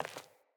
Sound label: Sandals Walk Default, footsteps; Player